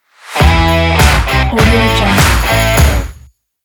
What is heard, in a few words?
rock logo